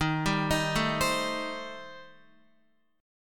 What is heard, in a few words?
D sharp 6th Flat 5th, D sharp, down, Guitar; arpeggiated; JGuitar